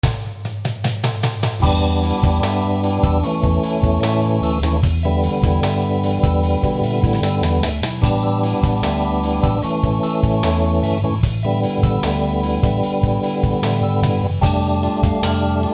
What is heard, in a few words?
organ tone